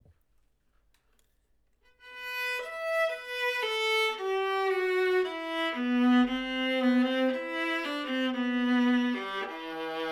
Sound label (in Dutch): music 16 inch altviool